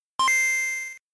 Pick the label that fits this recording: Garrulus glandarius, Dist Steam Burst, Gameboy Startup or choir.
Gameboy Startup